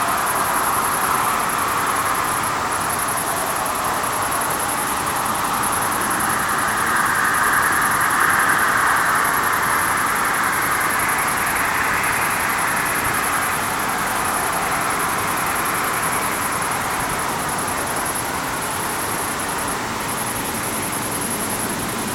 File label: cricket